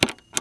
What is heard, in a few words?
CLICK